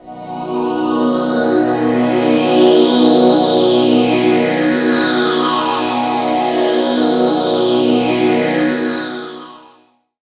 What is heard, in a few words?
Dream Pad; Jungle; Pads; Zero-G Jungle Warfare 1, 2 & 3; 90 s Sample CDs